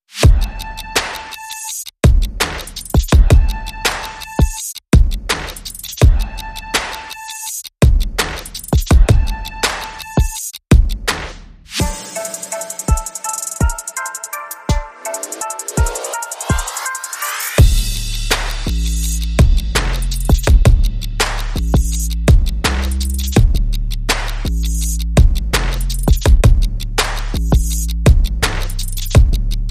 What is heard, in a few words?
Bass and Drum